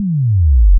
sub bass

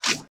Futuristic Weapons Sound Effects

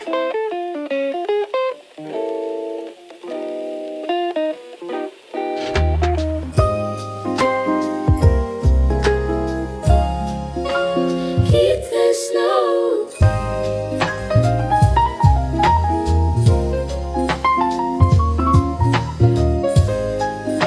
Jazzy Christmas Night

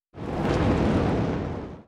Fire Transition Fire sound effects

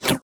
Futuristic Weapons Sound Effects

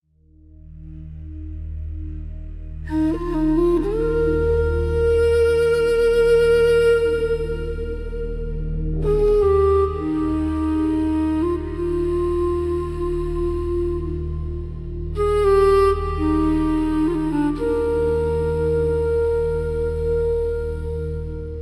Stream Flow, Calm

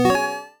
cure, Retro Game Weapons Sound Effects

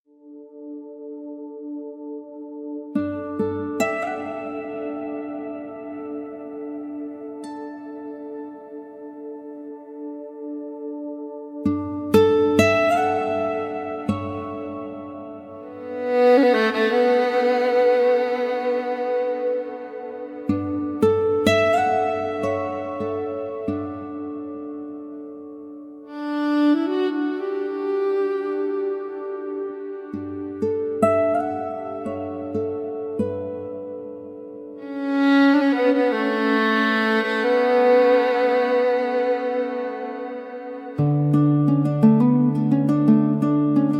Kitchen Hours is a calm instrumental

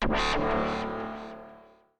magnet action

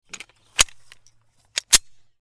9mm pistol load and chamber; Fire Weapons Sound Effects

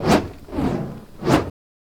Fire Staff, Fire sound effects

